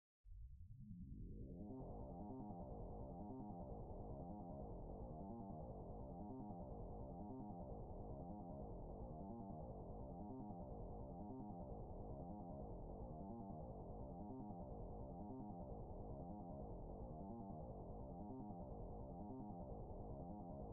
key

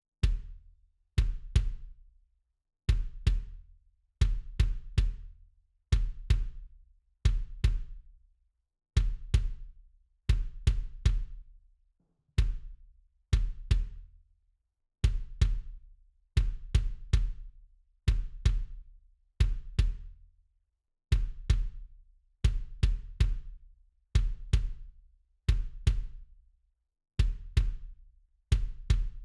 kick

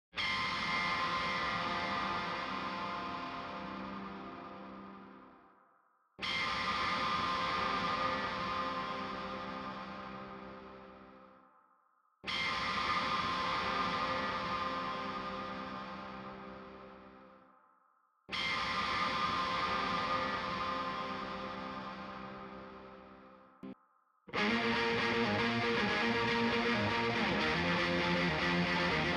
gtrs

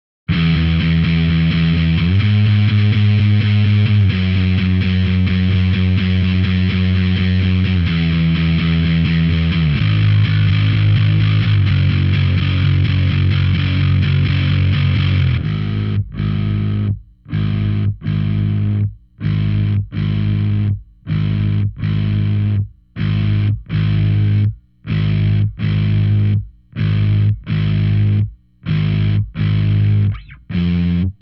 bass

